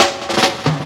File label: Loops Fills; Vengeance Samples